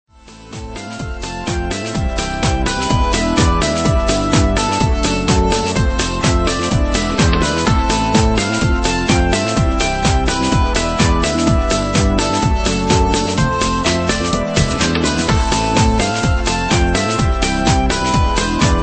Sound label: instrumental; kuba